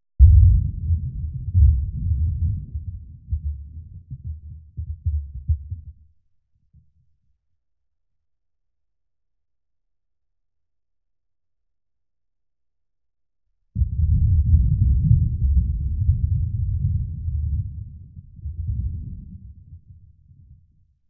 Explosion, SUB; 5.1 Surround

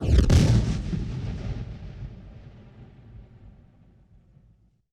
WWII Explosion With Flare; Period Combat

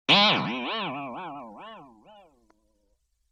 Boing Box; Vintage Cartoon; Hits & Tones

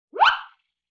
Wind Whistle Zip

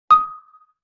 Xylophone Single Note D#; Vintage Cartoon; Musical Elements

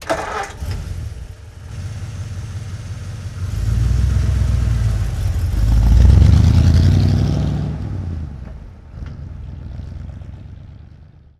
1947 Ford V8 Truck Ext Start Idle Away Slow; Period Vehicles

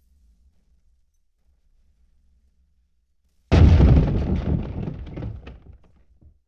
Explosion

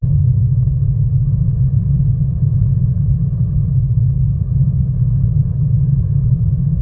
Machine Low Hum; Home & Office

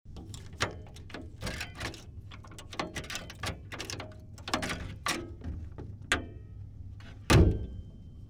1927 Sedan Unlock Key Movement Door Open Close; Period Vehicles

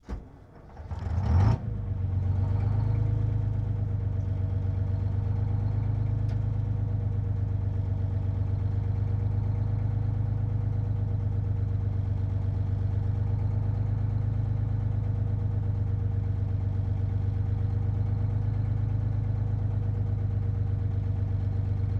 Bus Vintage Start Idle; Period Vehicles

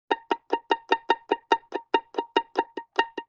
Pizzicato Loop; Vintage Cartoon; Musical Elements

